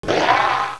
Mega Crust Attack

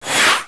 Knight Skill

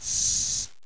Hell Spider Attack

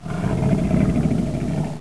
Yeti

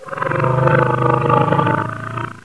Hound

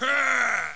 Assassin Die

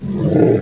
Gorgon Attack

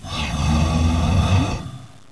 Dark Knight

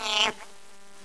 Goblin Die

